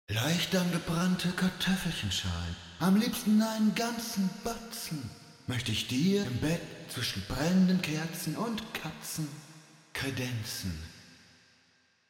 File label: Eventide SP2016 ST Room Vintage; OpaJott